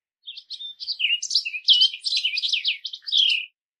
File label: barn swallow